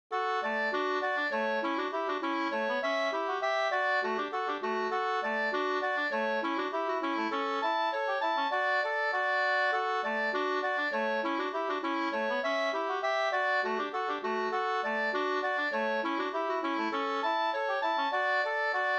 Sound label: polka; e Oliver Haglund Garpenberg 2st; Polka efter Oliver Haglund, Garpenberg, Dalarna